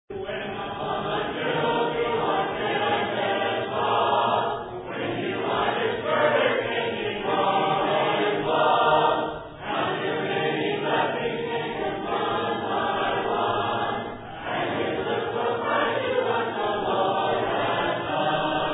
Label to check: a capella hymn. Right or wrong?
Right